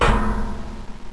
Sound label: player ladder; svencoop; aomdc